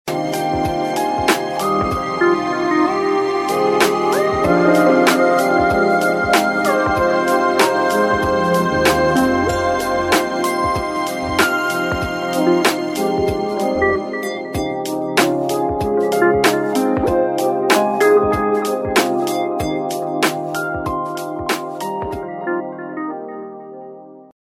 Fairy Palaces, Ring Tone; LG; GD880-Mini

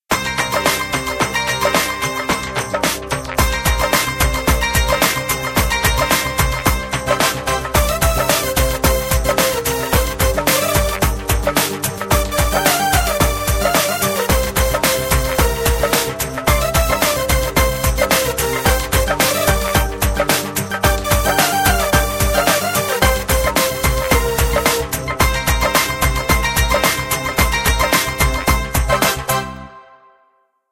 Punjabi beat, ringtones; Samsung Galaxy Pop India

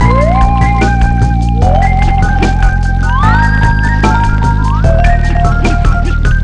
Suavity, ringtones; Explay Golf

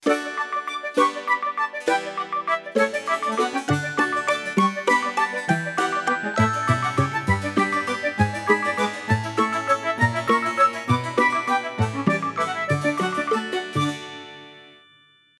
classical; LeEco; Le-2

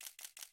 Camera Shutter

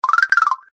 notification; Hisense; E602M

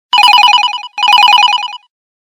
Sleepy Cat Beep, Ringtones; LG KE970 Shine

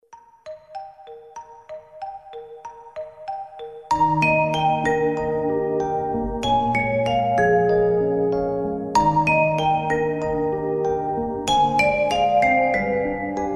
Rising, alarms

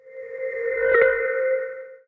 Notification

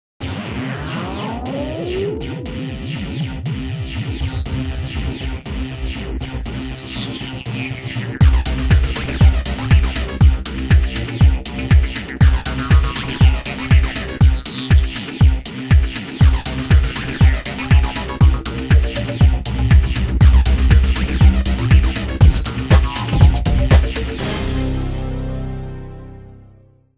90s techno; Microsoft; Windows Mobile ringtones

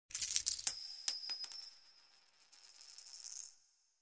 Spare A Coin Notification; HTC; Charity; Sound Sets

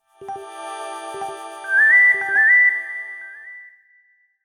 DoReMi

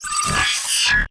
notifications; RED; Hydrogen-One

